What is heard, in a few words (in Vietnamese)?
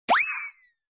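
Âm thanh vứt bỏ bỏ đi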